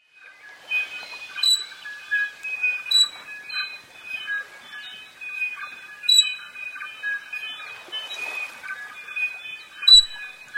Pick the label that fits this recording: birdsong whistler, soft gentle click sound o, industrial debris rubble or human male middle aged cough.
birdsong whistler